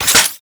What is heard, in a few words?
Reload, Weapons; Strun Prime